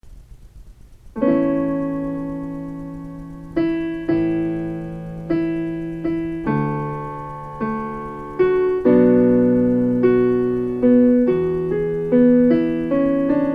alt stemmen satb

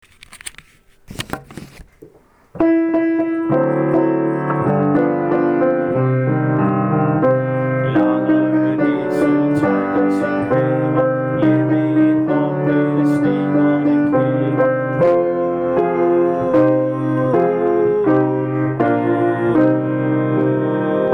sopran med akkompagnement; Aeselsangen

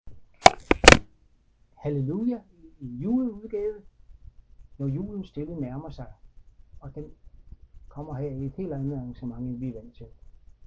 akkompagnement

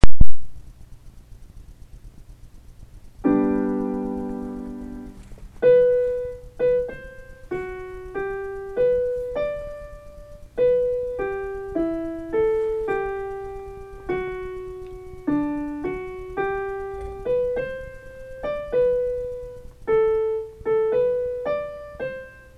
g dur sopran

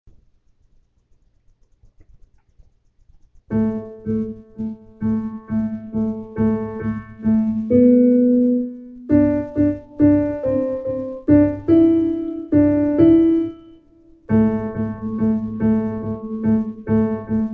2. stemme alt stemme; Frydelig

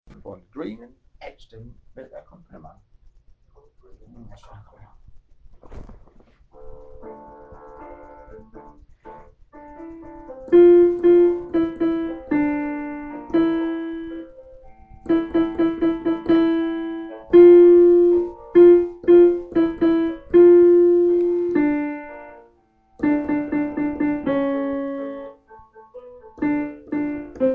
alt stemme

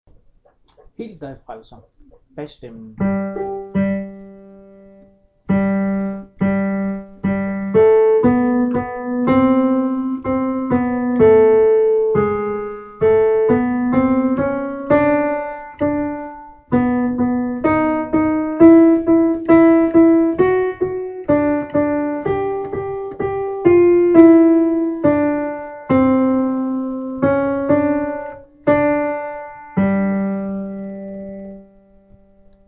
bas

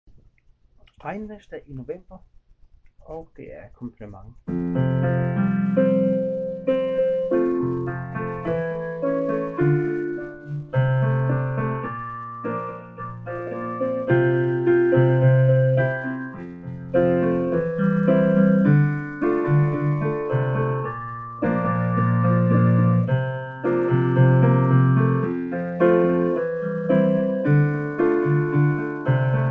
akkom

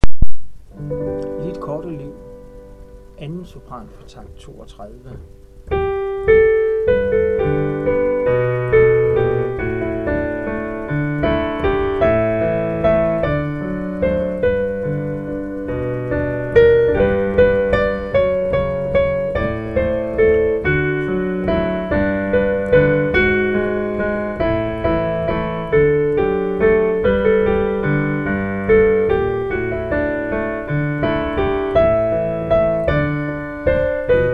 2. sopran

